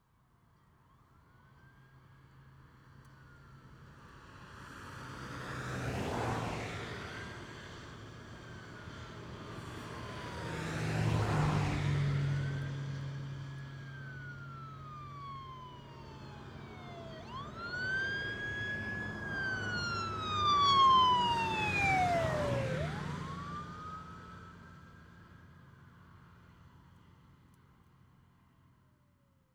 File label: Police Car, High Speed Pass R To; Emergency; BBC Sound Effects Library